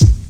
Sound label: puffy top end kick single shot a sharp key